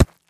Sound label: Concrete Chunk Drop; rocks & dirt